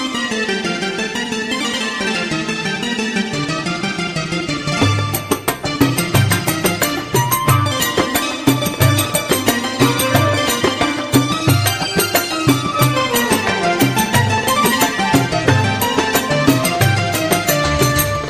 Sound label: Arabic Bellydance